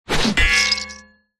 warfare weapon heavy axe swing hit body against wood clang impact squench; zapsplat